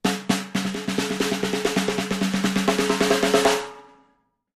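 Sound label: Drums Snare Comedy Accent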